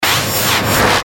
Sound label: Stinger Static Electric; Stinger Static Electric Swell